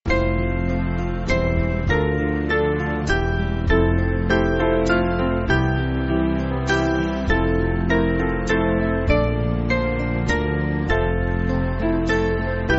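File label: Band, Music Backing Files for Churches; Petersen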